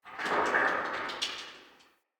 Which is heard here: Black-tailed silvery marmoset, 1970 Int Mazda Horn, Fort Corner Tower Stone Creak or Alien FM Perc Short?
Fort Corner Tower Stone Creak